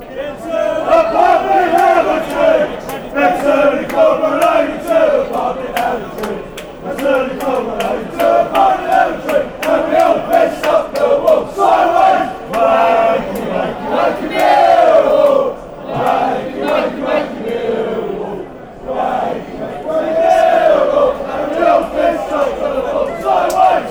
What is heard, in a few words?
pub song, fanchants